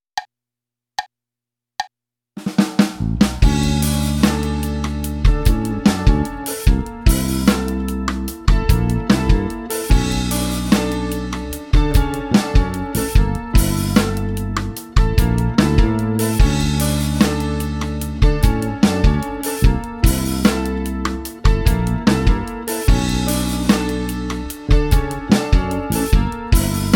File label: funk groove; bergeron guy